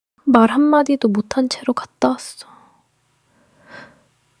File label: crying